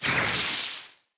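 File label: impact bullet energy titan shield arc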